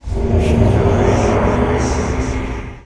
warp call

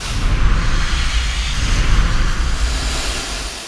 GAS ATT

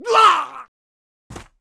Mob die; Monster; 묘족; 쌍부투사

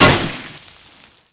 stone tomb

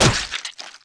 Wood Heavy Bash Wood; Monster

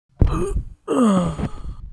mob die; Monster; 신궁후랑

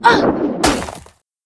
Mob die; Monster; 욱일승천류; 이천류무사

